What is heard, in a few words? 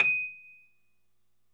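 Rhodes, D#6, left; 3D Sound; 3D Keys VOL-1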